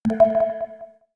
Dock; Coolpad; Tiptop-N2M

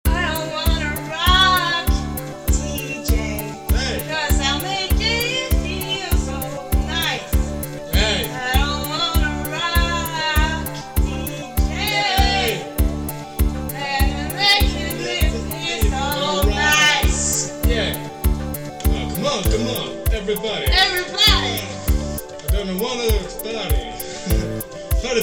Funny Rock; Arphiola ringtones; Funnytones

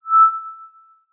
Note, notifications; Google Pixel 4; Pixel Sounds

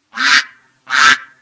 music duck, notifications, Samsung; Samsung GT-i7680; cmcc

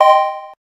electric, keytone

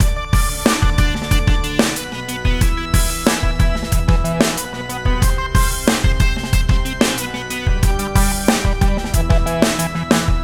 Churchy tone, ringtones; Google; NewWave Labs

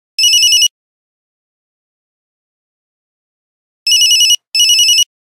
Nokia Buzzer Bee; Windows Phone apps

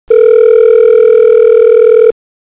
ringback; Asus P565

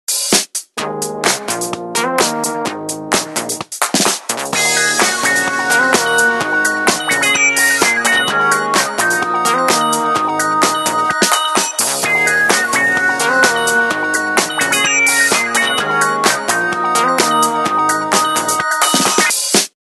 space circus, Motorola; A3100

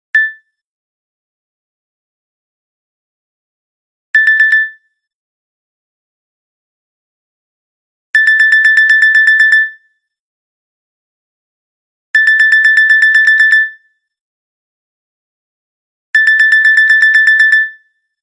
Clock alert, phonetones Nokia; Nokia E7

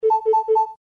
Message Tone; LG CX231

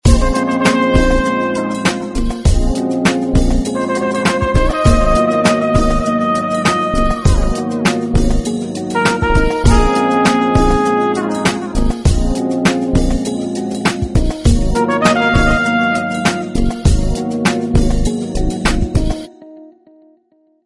Chill; Samsung SGH-i907 Epix

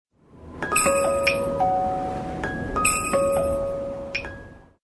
Message tone

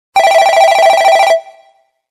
Old Ring; LG CU920 Vu

